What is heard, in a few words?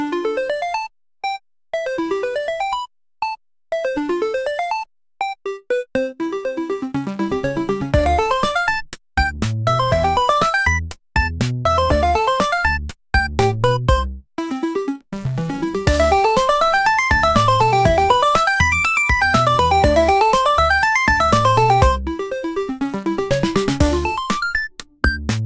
tune, chiptune